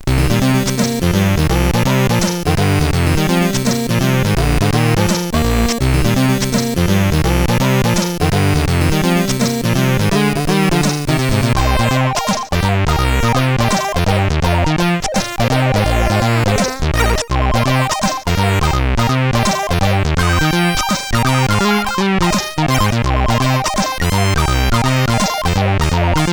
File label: funk level, CSG8580; CSG8580R5; Orcus; hvsc